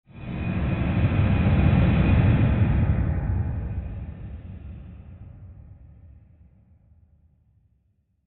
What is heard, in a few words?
Swell Airy Rush Rumble; Sound Design; Swells; Big Room Sound